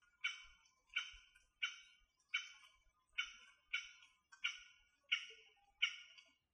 aogera nakigoe

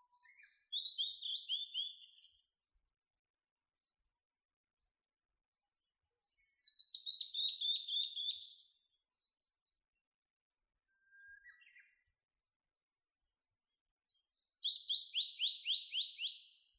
ooruri saezuri

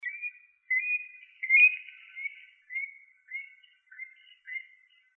kuina nakigoe

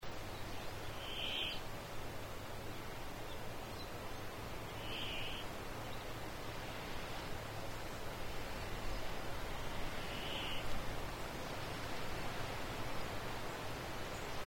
atori guzeri

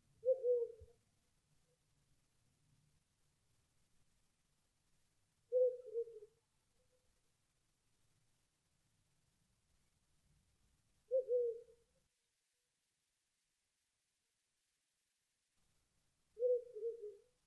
hukurou nakigoe

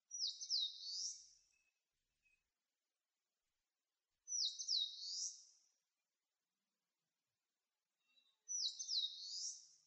sendaimushikui saezuri

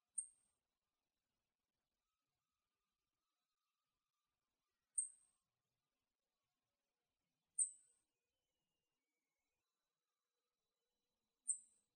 kuroji jinaki

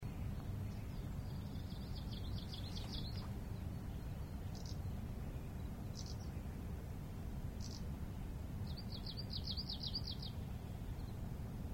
oomusikui nakigoe

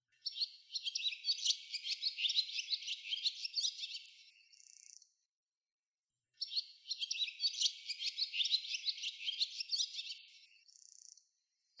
tsubame saezuri

